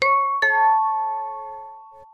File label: chime; operation